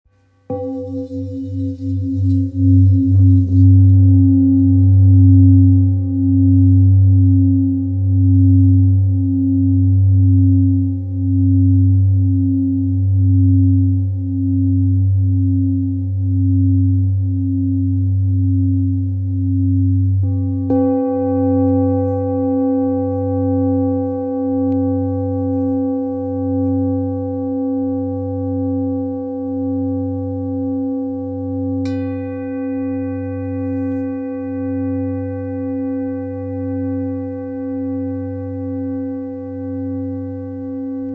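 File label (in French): Bol ramgandi do, bols chantants tibétains; Bol chantant Ramgandi à Paroi Fine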